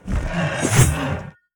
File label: attack; paimon